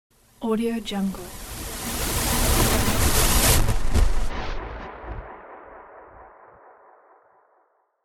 textured air sound effect

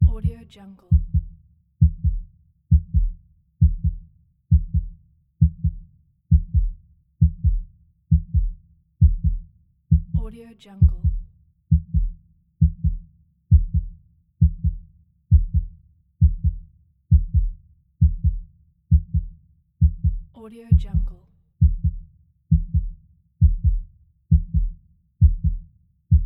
the heartbeats

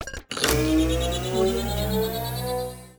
charging

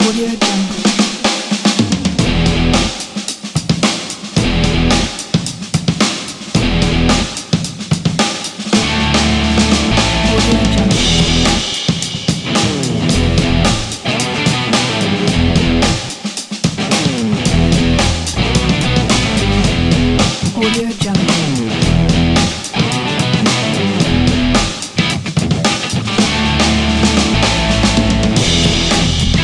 riffing mad

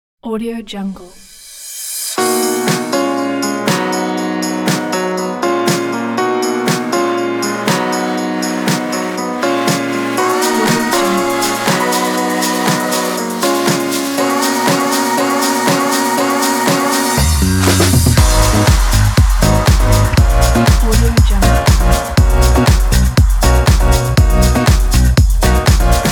funky and happy

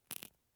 toothpick breaks

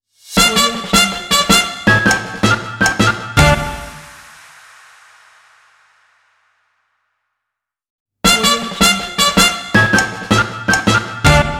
cartoon time logo

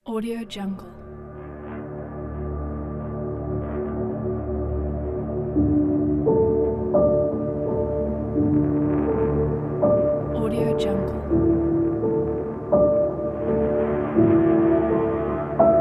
dark tense suspense

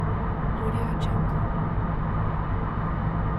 luxury car boot interior fast motorway loop

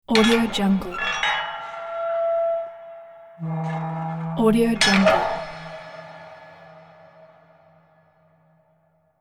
dungeon door

